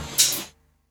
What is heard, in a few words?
HH, HIHAT HIP; Best Service Dance Mega Drums